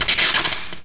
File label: teslarifle fire